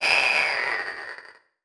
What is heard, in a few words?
Multimedia Internet CD-Rom Flash Wipe